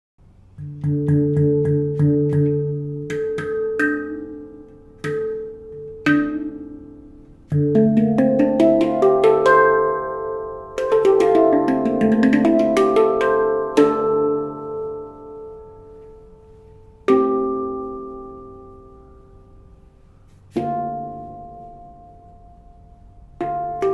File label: handpan 10 notes d minor